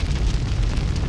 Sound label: fire ivy; wizard